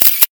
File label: Shutter; Samsung Galaxy Mini; Camera tones